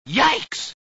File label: trap